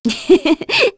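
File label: Vox Laugh, Korean; Aselica; kingsraid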